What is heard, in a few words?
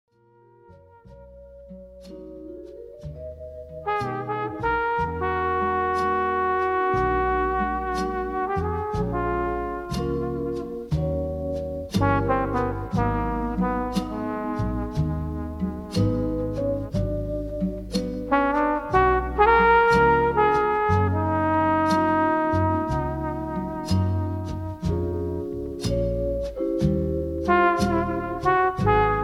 Lounge Cafe Jazz Music; Krasivaia Laundzh Muzyka